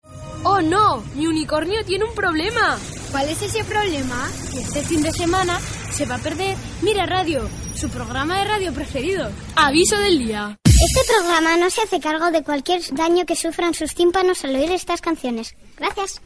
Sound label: jingle